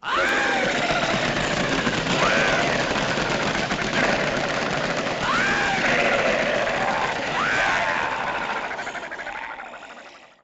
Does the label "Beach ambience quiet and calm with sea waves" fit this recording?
No